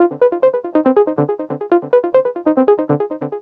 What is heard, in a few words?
NRG Lead, trance